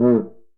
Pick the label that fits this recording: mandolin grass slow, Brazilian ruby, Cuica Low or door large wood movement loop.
Cuica Low